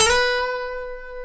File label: C#5 funk, Guitars; Spectrasonics; Hans Zimmer Guitars VOL-1; TAKA FUNK